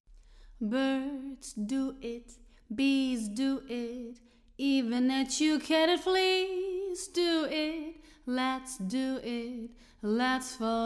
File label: Room